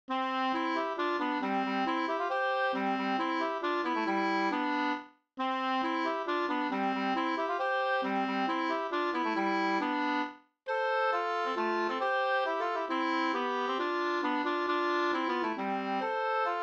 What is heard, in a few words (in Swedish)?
polska